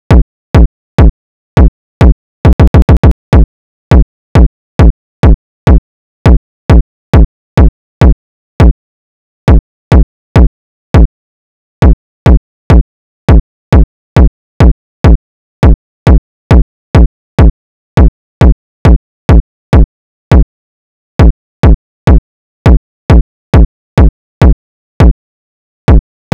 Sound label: kick